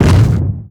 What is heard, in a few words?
body impact heavy, deaths